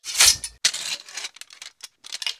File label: Inf Weapons; Red Orchestra 41-45